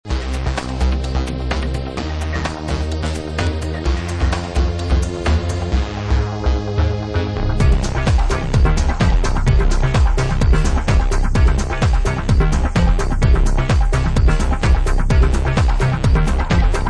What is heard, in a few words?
Melody Attack; house